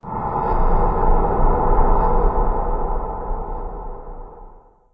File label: amb random abstract; video games; ambient; source engine; portal2